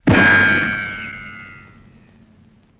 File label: Bullet Ricochet Ban, riccos